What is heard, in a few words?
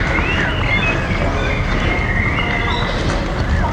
atmo